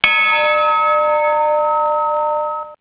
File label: old loco